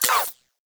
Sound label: SMG Mech, Weapons; Warframe; Tenno; Protea